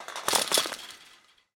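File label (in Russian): сильное дребезжание большой пружины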